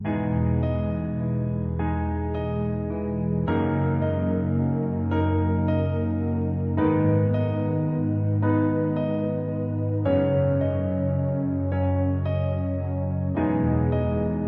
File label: piano bgm instrumental